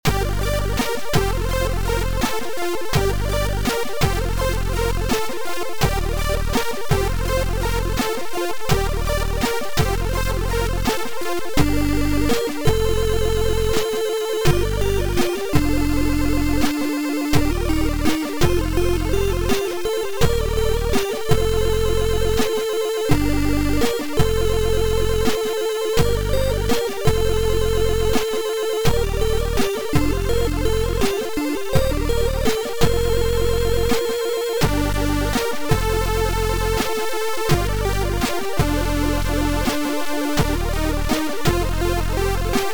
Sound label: Mine Sweeper Ringtone